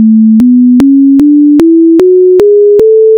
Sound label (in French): Gamme Zarlino; Mathemusique